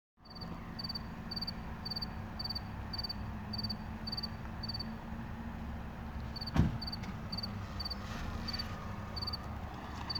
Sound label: Acheta domesticus; Michal Galan